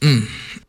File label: mmm male; ooh ahh